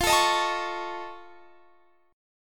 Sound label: F Augmented 7th F Guitar Standard, chord; F+7 strummed; x,20,19,20,22,21; JGuitar